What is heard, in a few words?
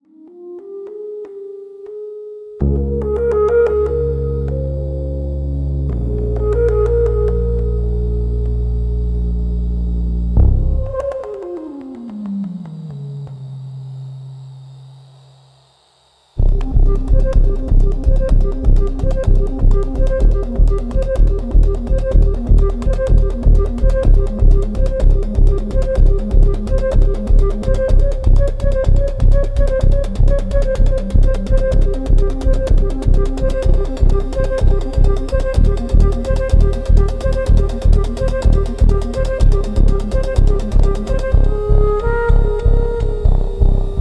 Dark Effects of Night Suspense; Tuneify; cinematic soundtrack pack